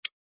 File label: button